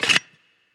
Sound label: table disappear; Fruit Fiesta; Wazdan